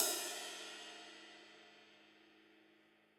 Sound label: hi ride, gretsch kit